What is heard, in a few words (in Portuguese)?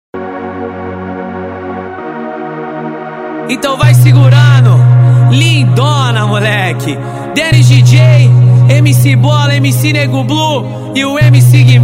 funk bass o poder do grave